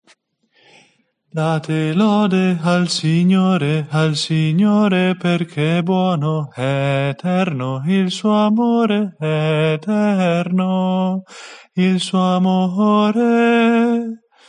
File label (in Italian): strofa finale tenori